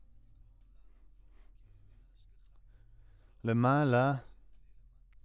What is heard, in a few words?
Voc OD